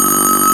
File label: ALARM, Fairlight CMI, BELLS